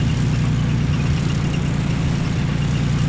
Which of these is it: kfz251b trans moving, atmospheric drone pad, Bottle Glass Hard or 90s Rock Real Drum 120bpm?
kfz251b trans moving